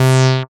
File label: SYN TB ORIG, Roland TB-303; TB303+MC202; Sound & Vision - Gigapack I CD 2